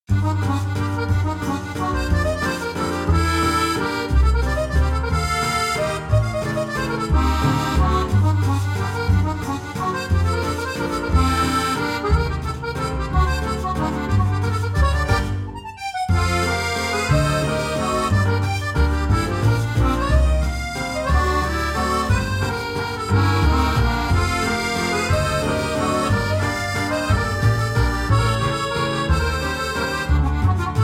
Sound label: French accordion; Children's Books